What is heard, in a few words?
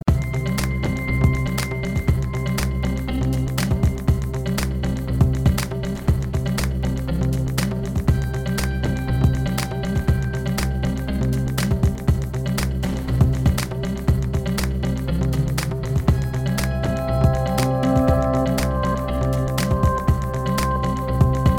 Fast Ambient Futuristic Game Loop; Party Planet